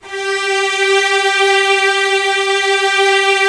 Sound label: A3 mid, lush strings; InVision Interactive; Lightware VOL-1; Instruments & Percussions